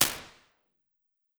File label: Clear Ambience, Ambience and Small Rooms; Convolution Reverb; IRs; Ableton